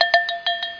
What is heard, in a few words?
buz; Macromedia - Multimedia Showcase 5.1